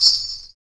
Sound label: METAL, Afro Shakers, Ankle Pods; Roland LCDP14 Africa VOL-2